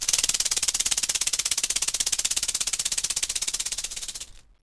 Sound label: maracas